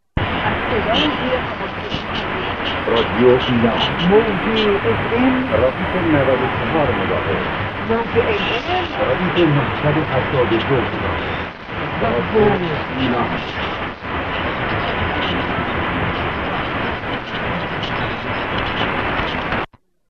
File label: 1395 Ilam ID; iran